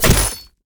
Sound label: knife stab